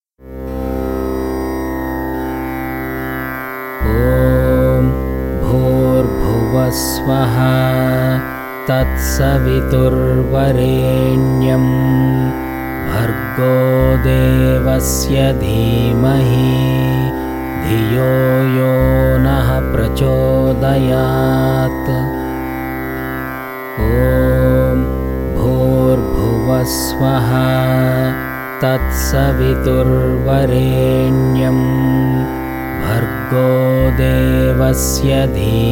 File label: Gayatri Meditation